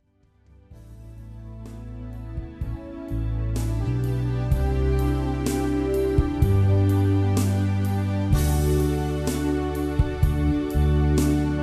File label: Lean Switch Mid gain CE2